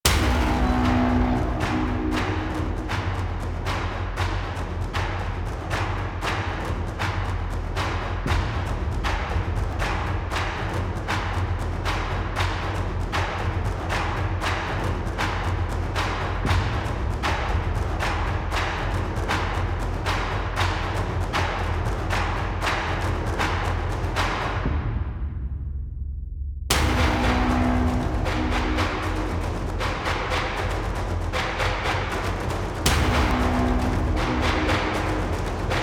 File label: Hvy Blades Large Perc; Warframe; Dynamic Music; Grineer; Grineer One